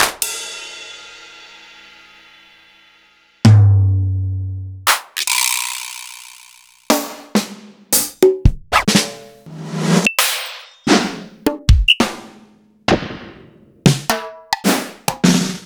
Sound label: Roland XV 5080; m8